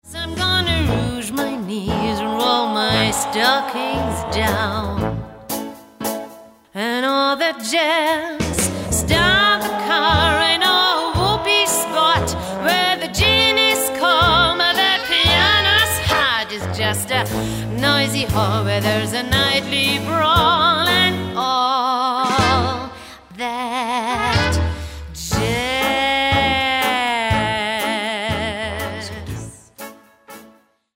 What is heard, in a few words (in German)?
Gesangsprobe; Chicago